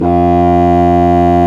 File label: WND CONBSO, ORCHESTRA; Roland - LA Composer vol. 1